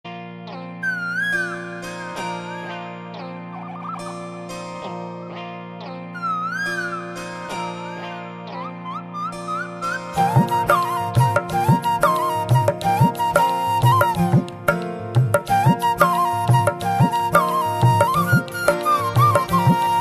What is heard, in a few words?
Best Flute Tone